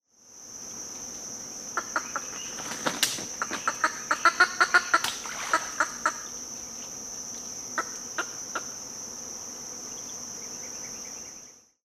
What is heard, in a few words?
Green Heron, Butorides virescens; wading largewater